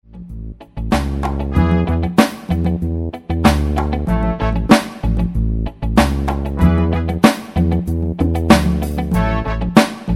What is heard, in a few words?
horns